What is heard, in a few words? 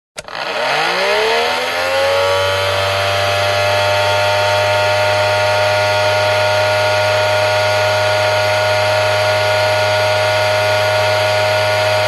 industrial pump electric handheld on off long burst close to motor powerful